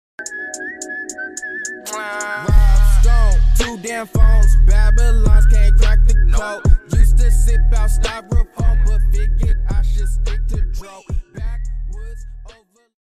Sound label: follow sound alert sound and donation sound